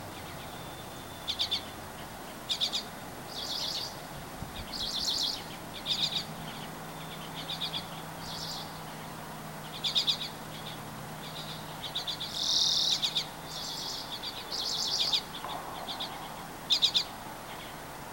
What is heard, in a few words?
birds